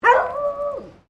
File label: ANIMAL DOG BEAGLE FEMALE BARK; Various domestic dogs; Animals